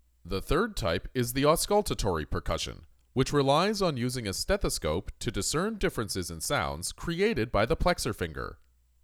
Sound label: Percussion